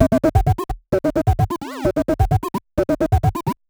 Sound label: Android Arps 130BPM; sci-fi; Loops